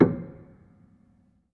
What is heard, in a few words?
Hamm Perc, Piano Hammer Perc; Piano Miscellaneous